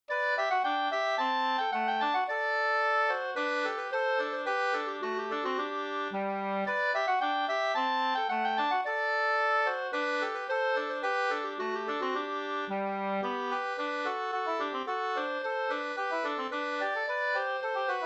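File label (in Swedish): slangpolska, two-part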